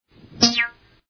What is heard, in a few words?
piup